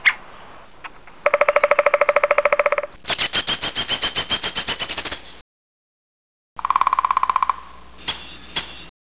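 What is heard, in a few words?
woodpecker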